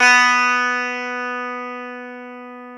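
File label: E 12 String guitar; Club-50 - Foundations Roland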